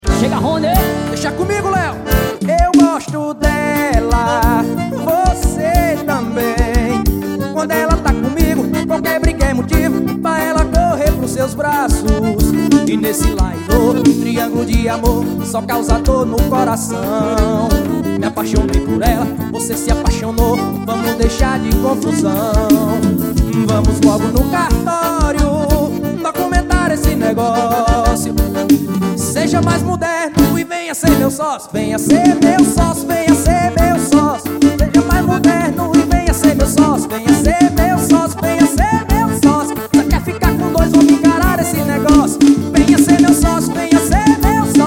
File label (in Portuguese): xote turbinado; wm music